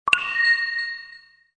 event ui show; croods

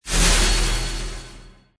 jump; croods

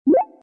button click small; croods

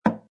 skill rotating; croods

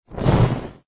bullet create; croods

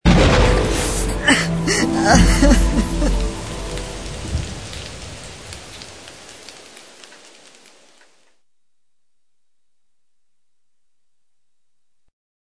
lose; croods

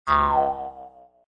monster skill; croods

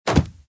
hitted wood; croods

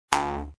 monster skill; croods

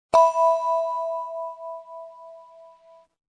levelup; croods

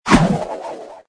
bullet create; croods

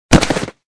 fell ground; croods; entitydead

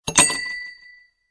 star up; croods

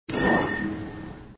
gear up; croods

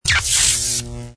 thunder; croods; battlespecial

